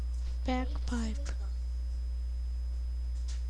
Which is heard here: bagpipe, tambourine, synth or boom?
bagpipe